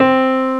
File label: Bright Piano (C3); X-Wing Patches